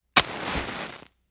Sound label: Sngl Match Strike; lighters & matches